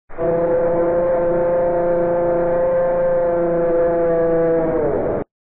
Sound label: scream